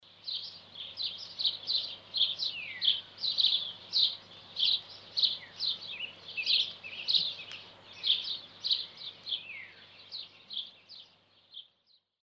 wrobel; karty obrazkowe; przygotowania do zimy

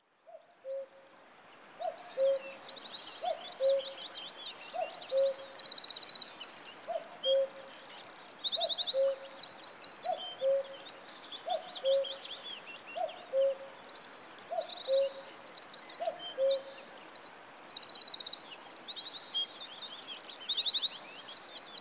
letni poranek; kalendarz pogody

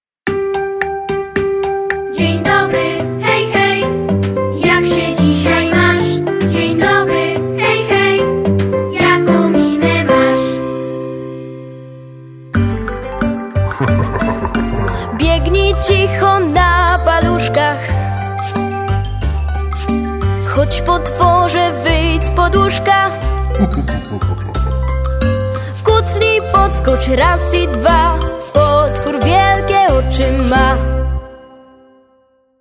plas wokal; szesciolatki; multiprzewodnik

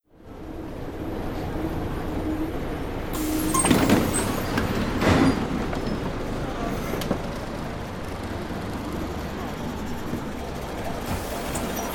autobus; karty obrazkowe; w drodze do celu

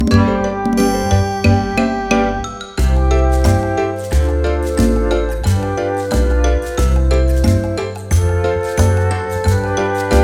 plas bez wokalu

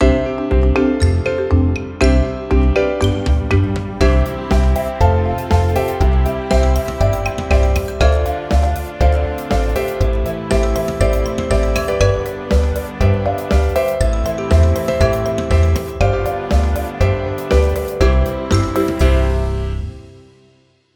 plas bez wokalu

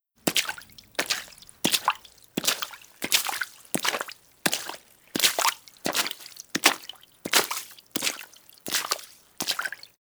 skakanie po kaluzach; kalendarz pogody

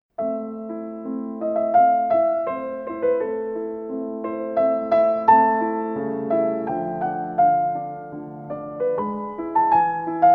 skrzypce fortepian beben; druzyna marzen; 4-latek; instrumenty

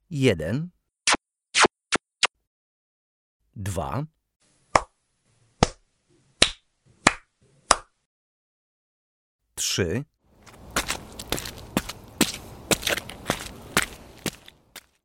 odglosy; karty aktywnosci; cmokanie klaskanie tupanie w kaluzy

